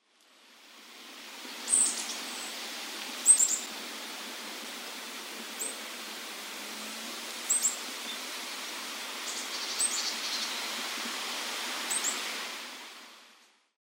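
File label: Yellow-bellied Tit; kibaragara; miyazaki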